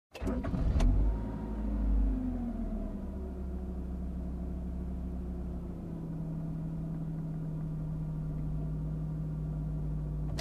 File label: Calm ride in car